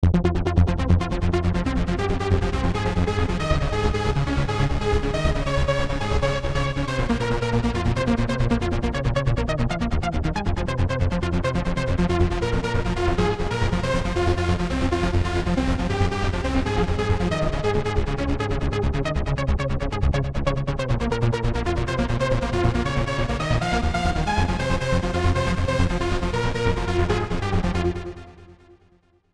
trance

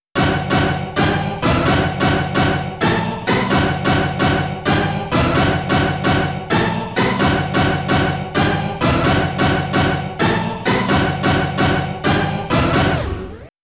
orchestral

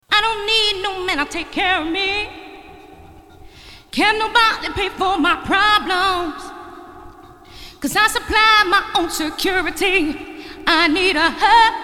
reverb

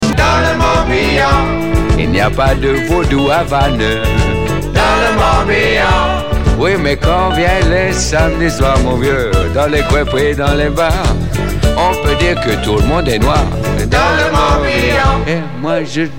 big radio sound

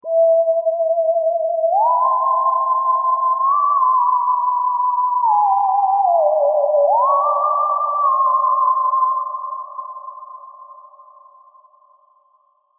theremin

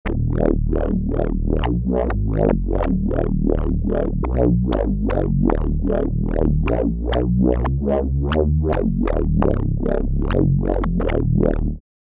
bass; ferox

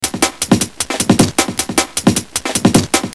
jungle

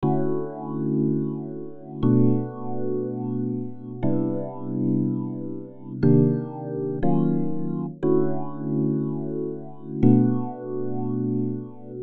ep station effect rhodes

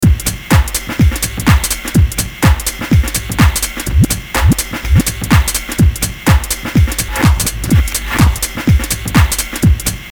Hammer Beat

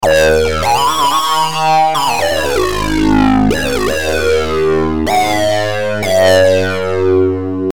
hardsync

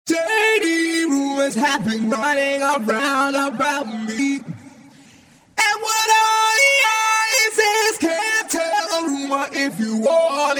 Dirty Love Autotune

